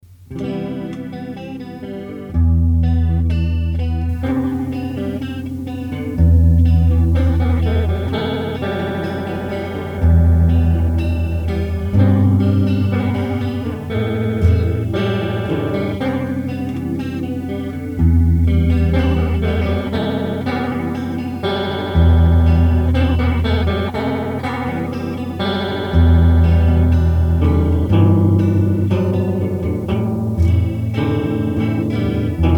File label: Coral Ensemble; circuit demos